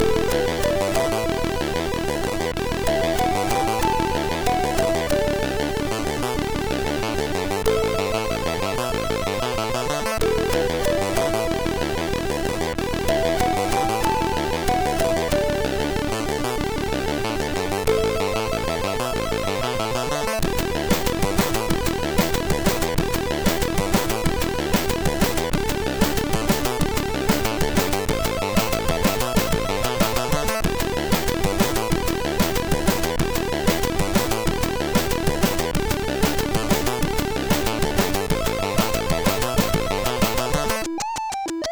Jingle, MOS6581R3

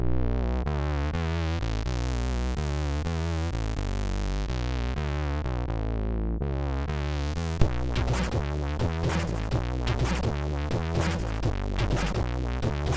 Meow, MOS6581; MOS6581R3

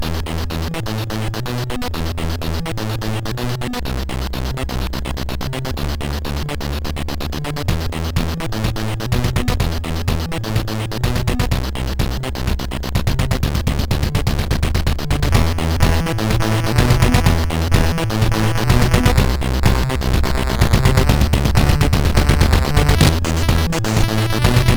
Amiga version, MOS6581R4

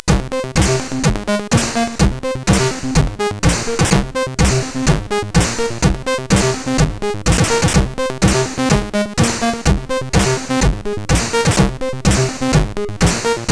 Perverted Ringmodulation, CSG8580R5

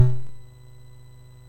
sid MOS6581R2, GAMES; Face Off

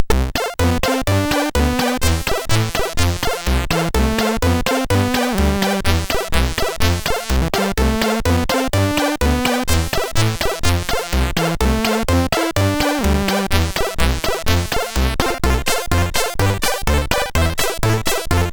sid CSG8580R5; hvsc

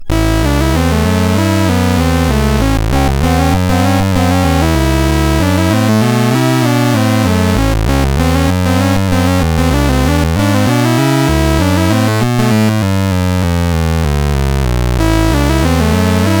Computer Blues BASIC, CSG8580R5

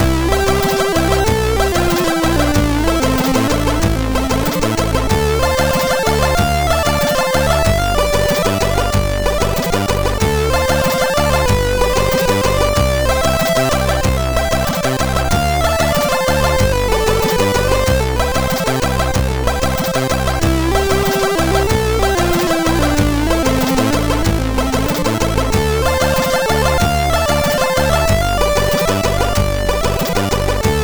Melodious, MOS6581R3

